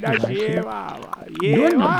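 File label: Music Village Calm Inside; From Dust; PS3; Xbox 360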